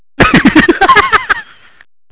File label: laugh